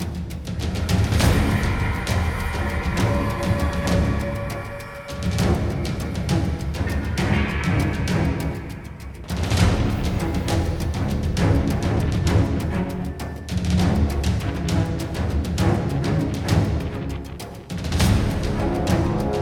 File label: Battle; Dark Eye The Demonicon